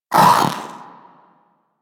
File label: Vocal Bark; Warframe; Pets; Zanuka; Zanuka Companion A